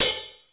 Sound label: H-HO M1, DRUMS; East West; Global Sounds